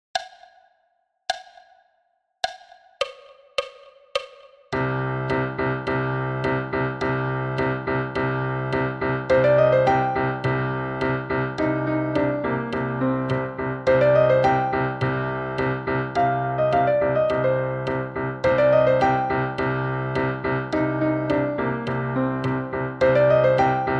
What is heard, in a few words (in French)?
timbales Piano block a 105 bpm